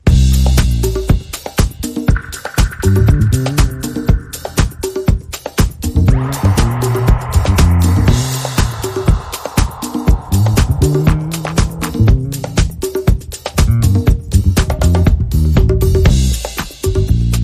Drum & Bass Only

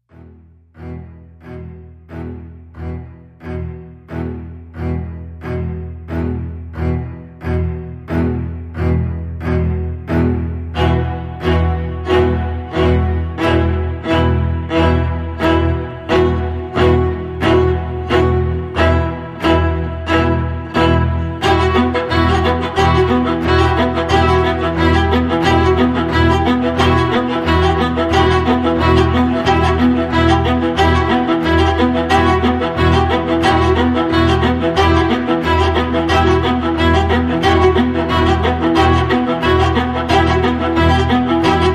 Strings Only

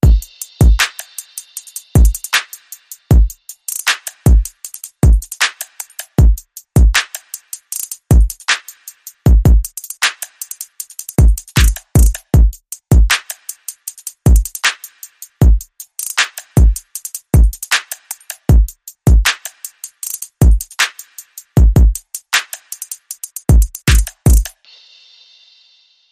Proud Promotion Beat

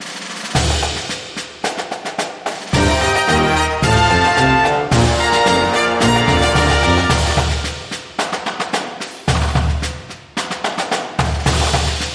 Halftime Show

